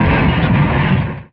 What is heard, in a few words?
explosion; 3DO; Portfolio 2.5; Jumpstart; UFO